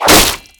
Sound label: arrow impact concrete; weapons